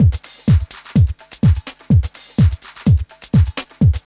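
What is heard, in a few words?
drum loop; X-Static Goldmine 3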